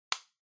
Kitchen Timer Cover Close

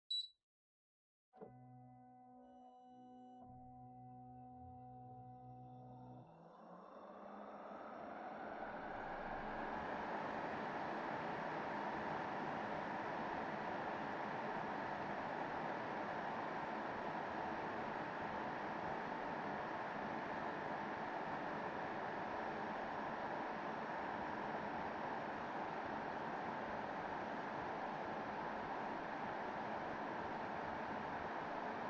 Air Conditioner Far High

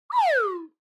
Slide Whistle Short Down

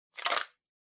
Outlet Timer Noise; コンセントタイマー01-9(ノイズ); タイマー機器

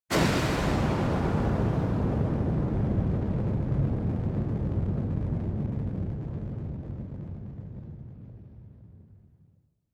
Onoma Rumble Long

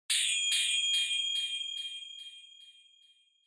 Onoma Flash Low Delay; オノマトペ 光る; 低 ディレイ

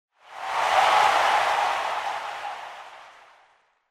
Cheer Crowd Short

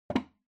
Music Box-Foley (Put Down)

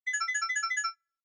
Censor Bleep Synth, Short

